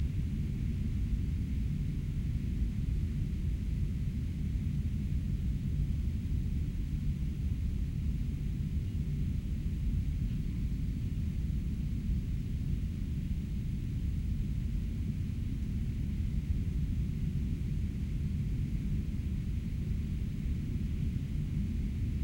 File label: AMB SERVANTS QUARTERS